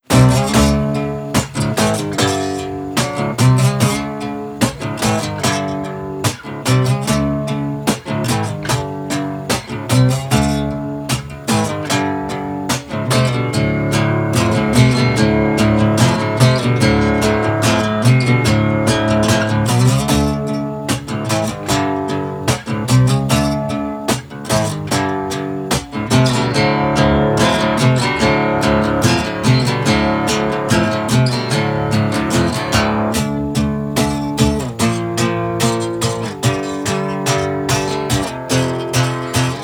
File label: Guitar Riff